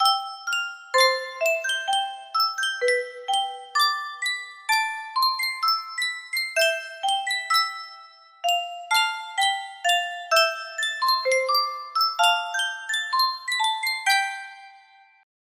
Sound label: Sankyo music box, music box melody